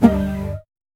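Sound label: a double taut string release, bow; sound effect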